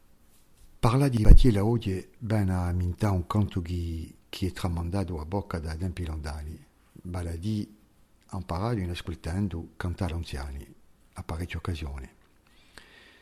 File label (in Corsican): cantu pulifonicu; isula mondi